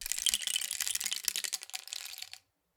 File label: Rain Stick, Percussion Samples